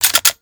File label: Cannon Fire Mode Switch Rocket; Grineer